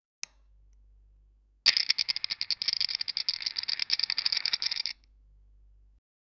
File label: spinning a wheel in game, game show